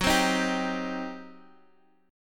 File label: G Major 7th Suspended 4th Sharp 5th, F sharp